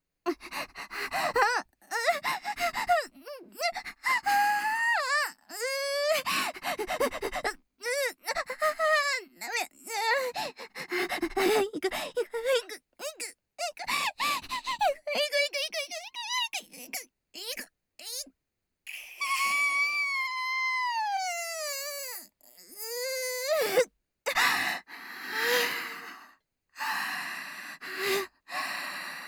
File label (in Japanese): 絶頂（SEなし）高潮